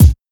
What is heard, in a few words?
silky kick one shot D# key; kick drum